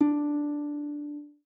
Harp, notifications; OnePlus 12

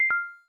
cam stop; LG; G4-Stylus

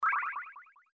call connect, Samsung SGH-i617 BlackJack II

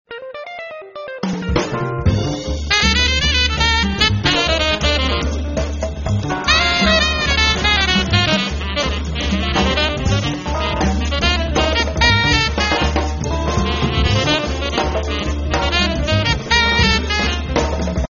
Jazz Bar; Samsung; SCH-U650 Sway

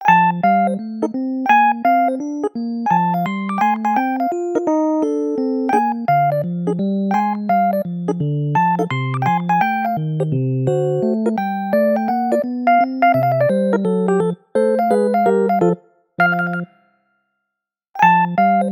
Jazz Tone, ringtones; LG Aristo 2 PLUS X212TAL

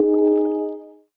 chat person joins; Nokia N900

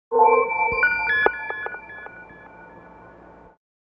Oscillation, Nokia Tones; Windows Phone apps

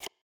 square unlock; LG Aristo 2 PLUS X212TAL

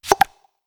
Ticket, notifications; LG G3 Stylus

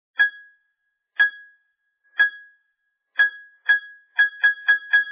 Camera ready, camera; Huawei C5110

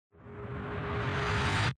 dice

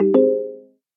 traffic report ding dong; Samsung Galaxy S10; Maps

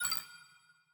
Dock

